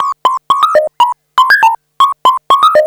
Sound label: arp seq 120; AMG - Vince Clarke Lucky Bastard